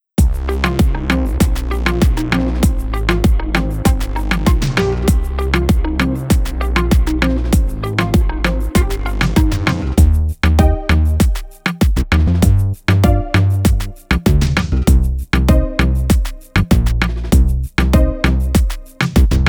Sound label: instrumental pop